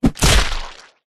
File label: impacts body hit baseball bat hard whack crack squelchy bloody